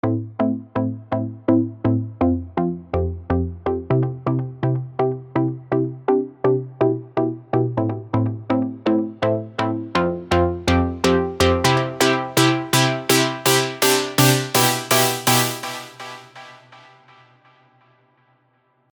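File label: Spire, pluck; Hyperborea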